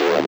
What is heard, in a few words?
Nore Bass, Bass One-Shots, Drum n Bass; KJ Sawka - Drum n Bass & Beyond